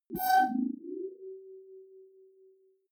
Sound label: query smooth high, signale